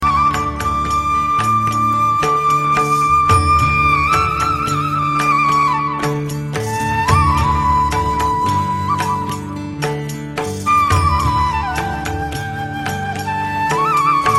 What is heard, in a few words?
Flute